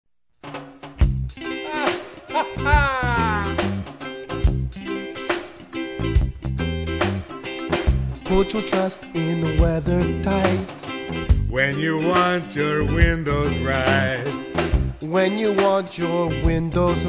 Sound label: Reggae, Jingles